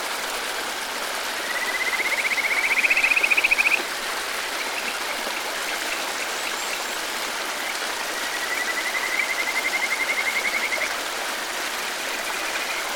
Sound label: mountain stream